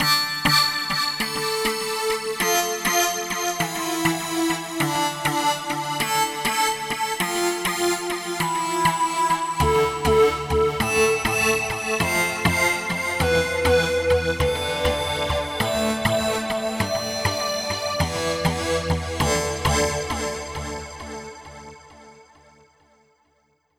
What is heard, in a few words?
Key Clammer